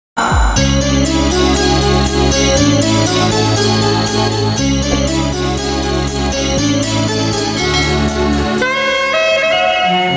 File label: bgm kannada; upendra